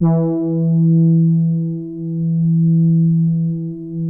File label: POLY SOFT E3, SOFT BRASS; Music Store - Claudius Diemeter Schwerlos